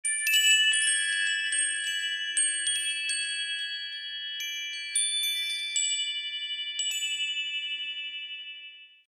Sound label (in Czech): cinkani rychle